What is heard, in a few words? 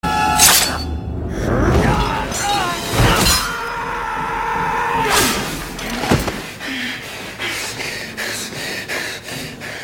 shout; wolverine